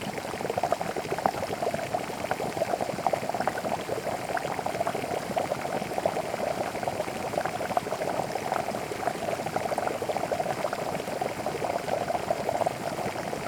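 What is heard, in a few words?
Water Bubble Water Boil; water sounds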